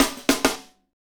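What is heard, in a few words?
hip hop, Roland drum beats; Northstar - Drumscapes Roland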